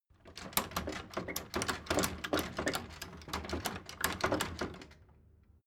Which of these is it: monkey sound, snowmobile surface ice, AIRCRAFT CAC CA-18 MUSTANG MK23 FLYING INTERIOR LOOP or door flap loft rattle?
door flap loft rattle